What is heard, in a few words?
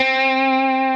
Left-hand vibrato